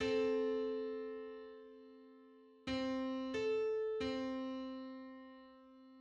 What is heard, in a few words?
Eight-hundred-fifty-fifth harmonic on C; MIDI